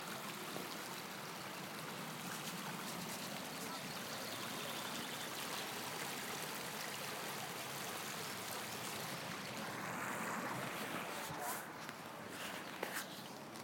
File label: babbling brook